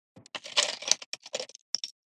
wood material

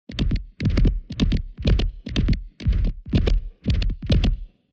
horse stone texture

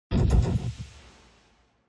UI comform sound

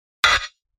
UI invalid sound

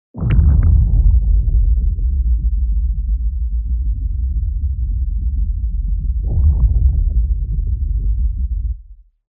Under water

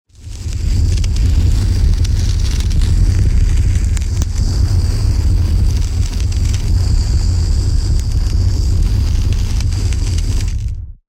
JET FIRE

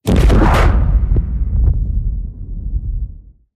Explosion

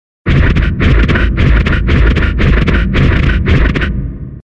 Robotic footsteps

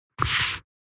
UI dumping sound

